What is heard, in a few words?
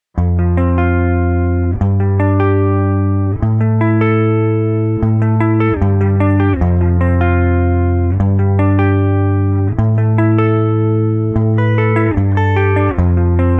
Mini Boss Theme on Guitar; Sonic Knuckles